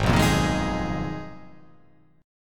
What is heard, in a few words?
Ab Minor 6th Add 9th, Ab; strummed; JGuitar